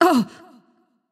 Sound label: notice; smite; voicepack; medusa